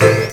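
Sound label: PIANO loop; Ragga & Dub Reggae; PIANOS; AKAI; USB Soundscan vol.27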